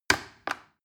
plastic button little click heavy slow

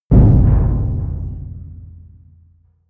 big metal impact low long

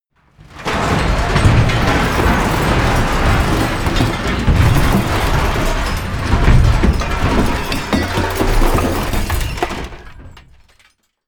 metal loud medium

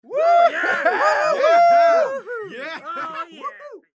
cheers

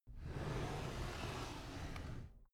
drawer cabinet carton close soft

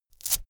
velcro open cap fast

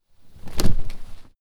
clothes harsh hit drop

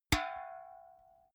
big can hit hard

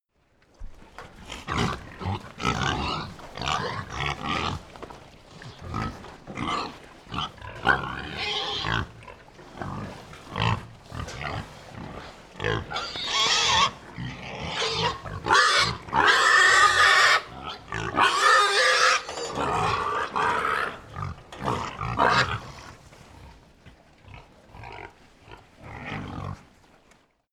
pig herd grunt, pig

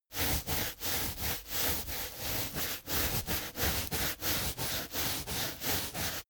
balloon scrub hair

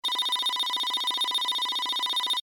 title running layer signal

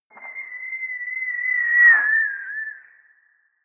air strike

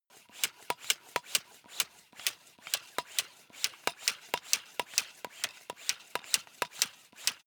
air pump small sequence fast

